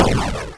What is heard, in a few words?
SOUND LIGHTNING SHOT